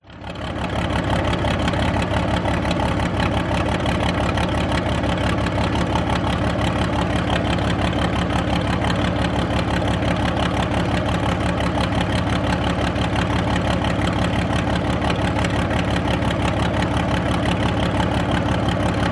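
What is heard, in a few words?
warfare tank matilda mark 2 1939 idle ext rear close to exhaust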